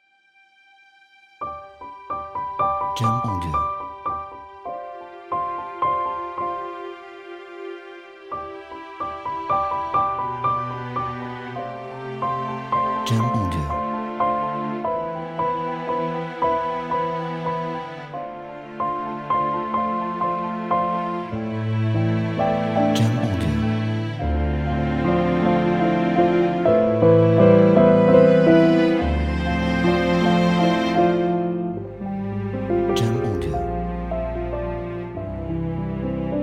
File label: Romantic Emotional Piano